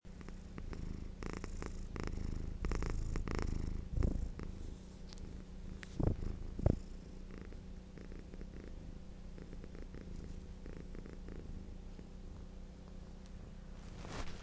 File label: cat purr